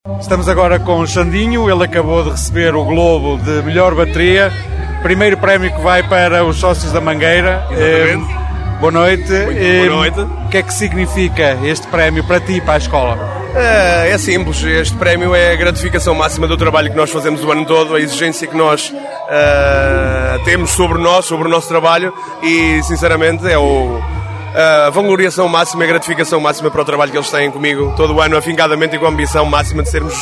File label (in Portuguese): Samba Bateria; Globos do Samba; Samba Com Vida